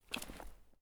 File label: beacon place; Escape from Tarkov